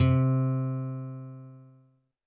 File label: Roland TD-20 Percussion